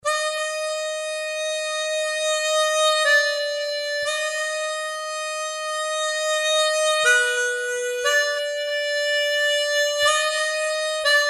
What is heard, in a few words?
Chromatic Harmonica DRY; Chris Hein